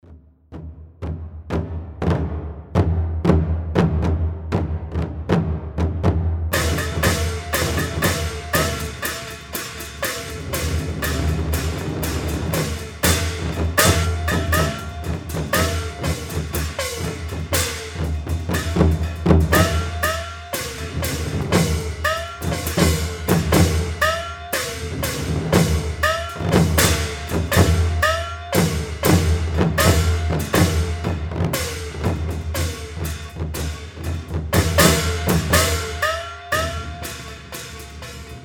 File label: Peking Drums Gongs